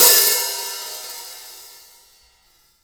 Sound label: Hi Hats; Instruments & Percussions; InVision Interactive; Lightware VOL-1